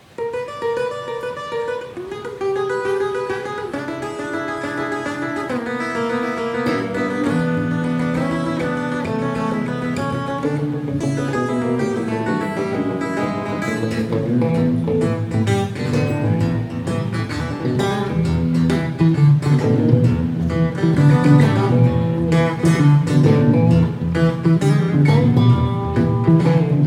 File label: guitar